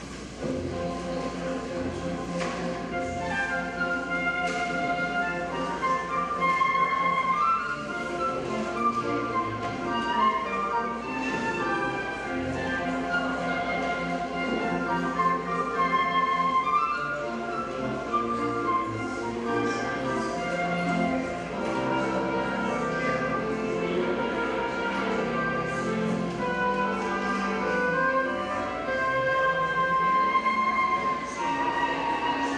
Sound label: flute, postlude